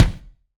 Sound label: BD, BASS DRUMS; EdgeSounds